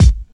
short kick one shot g sharp key, Royality free kickdrum sound tuned to the G# note